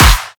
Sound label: drum snare classic riddim; Virtual Riot; Drum Hits